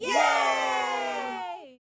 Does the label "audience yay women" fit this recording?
Yes